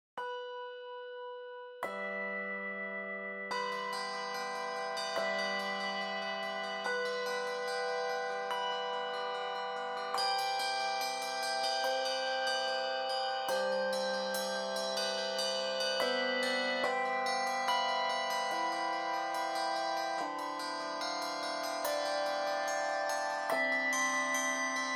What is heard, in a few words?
River Poem Bells on Water Shimmering